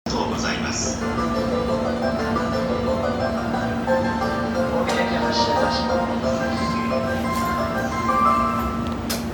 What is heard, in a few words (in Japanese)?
京浜東北線西日暮里北, train sounds